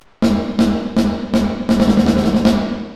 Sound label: IR Mod Rate 5.00 Hz; Reverberate3; FUSION